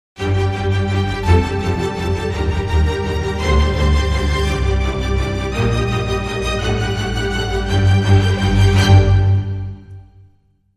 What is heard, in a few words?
Drama Queen, Sting